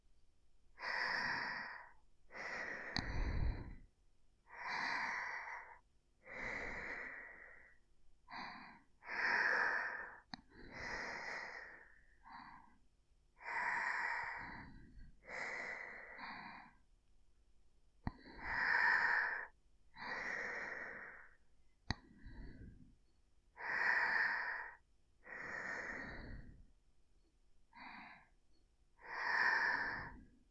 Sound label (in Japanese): 寝息; 由真